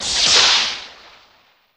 Lightning Bolt, thunder

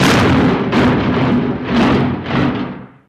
Big Metallic Multi, barrels buckets & cans

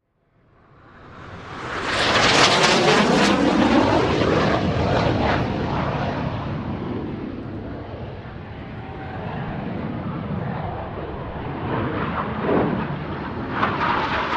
Plane MiG-29 Fulcrum Fly

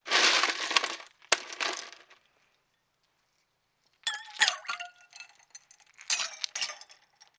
Ice Scoop Ice Drop Wat

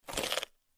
Bite Vegetable Peppe

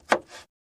Cutting, Slicing Carrot, Single Slice; utensils

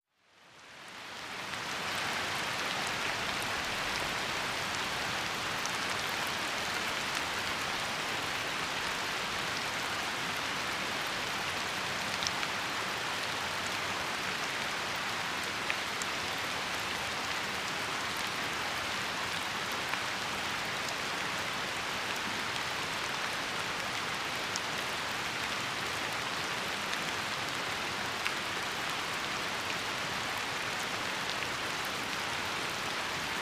Water Steady Hard Rai

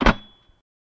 Metal Latch Clunk Ex, latches & bolts

